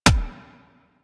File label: Weapon Punch Rifle; Warframe; Weapons; Rifles